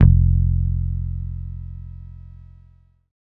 BASSES, Roland

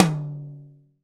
TOM TOM, Real

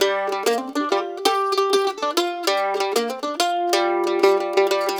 LICK, Blues Guitar

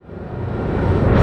IMPLODE, PERC; NorthStar - The Wizard VOL-2; TSAR PERC

